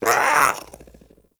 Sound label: Pet Speak; Warframe; Catbrow; PetShip